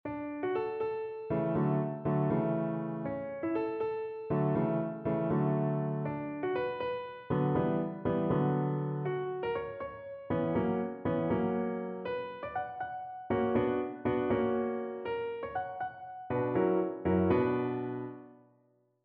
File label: Modulation